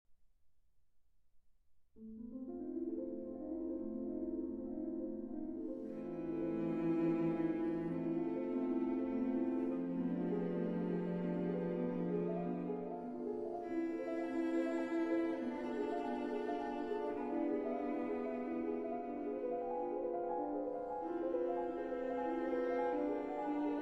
Piano Trio in A Minor, Allegro; Op. 150 I.

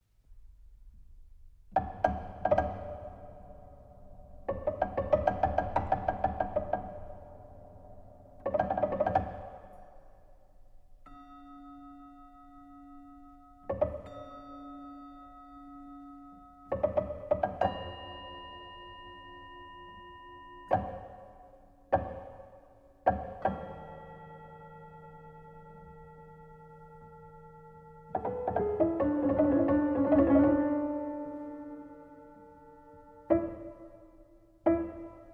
Gamelan

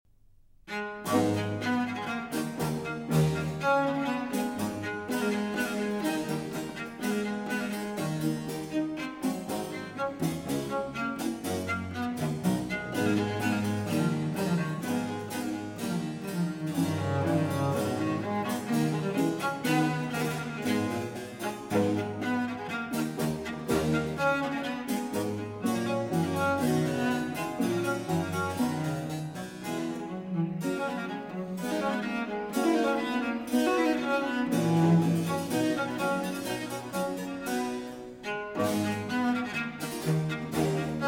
Sonata For Cello and Continuo in A Minor; Vivaldi; R.43

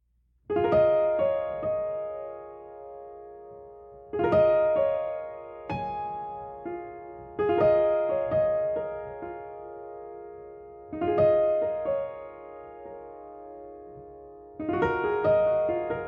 Improvisation

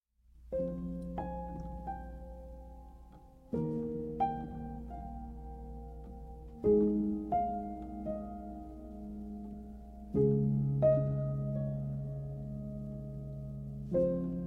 Piano Reworks

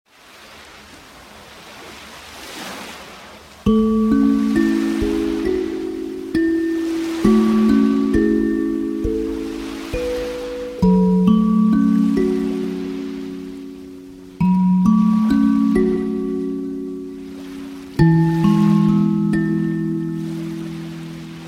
Kalimba Sounds

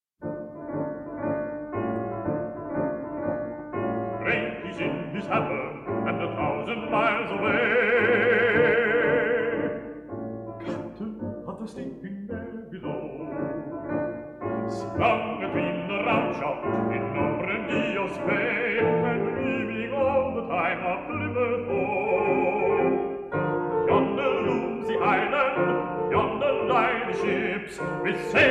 Male Voice Choir; Climax Male Voice Choir; Songs of the Sea, Op.91; I. Drake's Drum

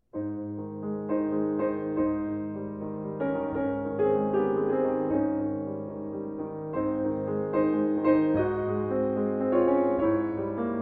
Solo Piano; Frühlingsglaube, D. 686

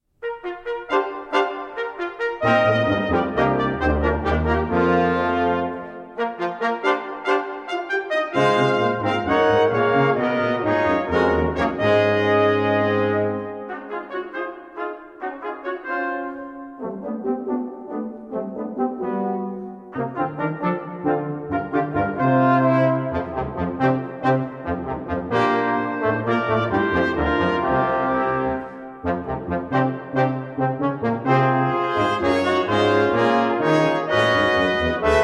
Brass; Red Cedar Lake Suite II. Seagulls Dancing on Red Cedar Lake

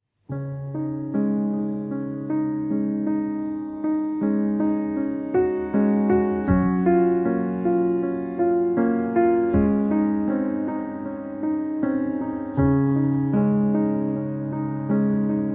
Solo Piano

